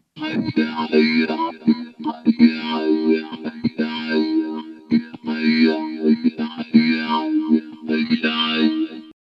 Spacey, RP200; DigitechRP

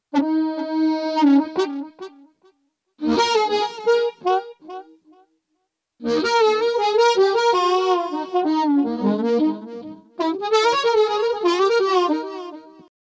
Spacey; DigitechRP

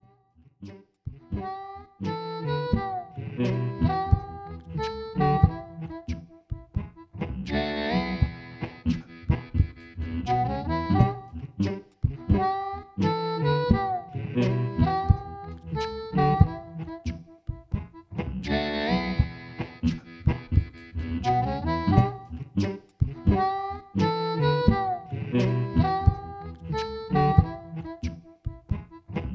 Quartet

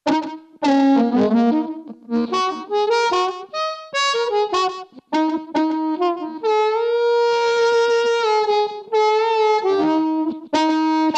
Hard Blues, RP200; DigitechRP

